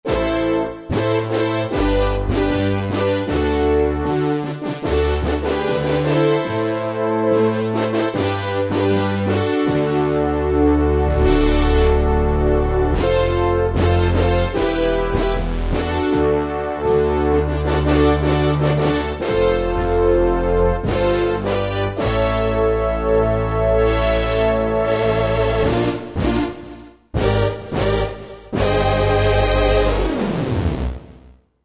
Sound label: Analog Brass, Roland JD-990; Factory Patches